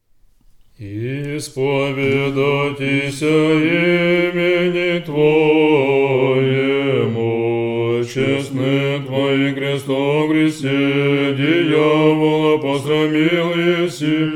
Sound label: tone 3; tone 5; sunday